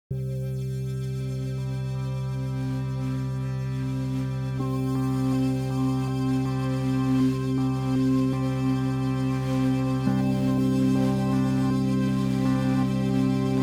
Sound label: stems